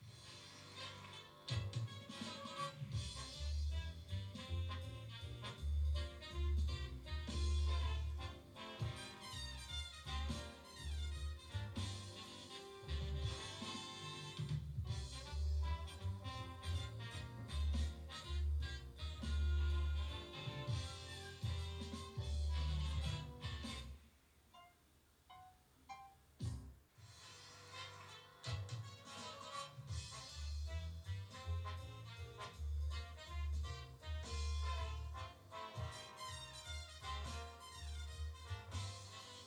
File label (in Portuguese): vinheta; do jo audio recorder